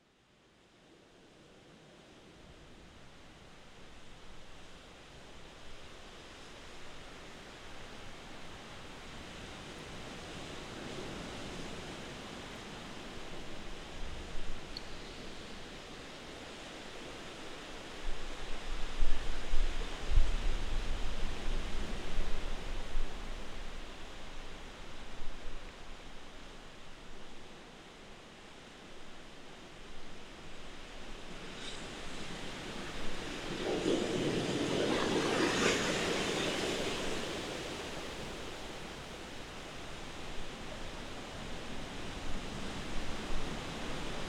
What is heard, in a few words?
hydro whales; waterline